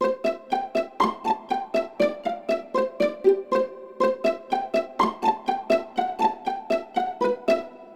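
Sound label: violin pizzicato; Smeshariki Chiko's Computer; Skripka Piz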